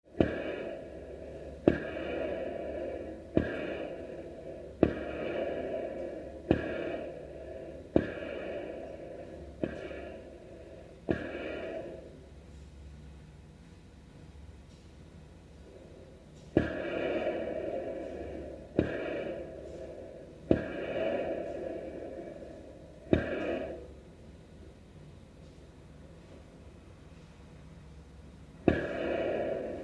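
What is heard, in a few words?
Steam Venting From Pipe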